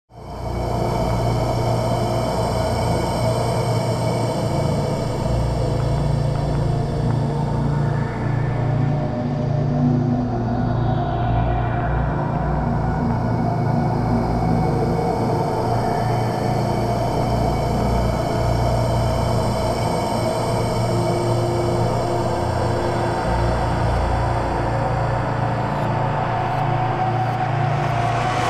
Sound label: NIGHT TERROR DRONE